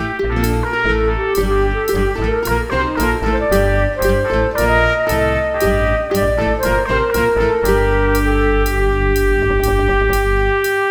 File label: loop mixdown